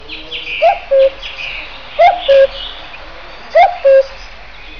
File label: Common cuckoo